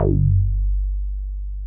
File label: MINI, MOOG BASS